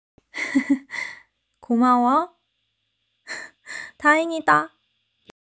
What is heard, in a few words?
laughter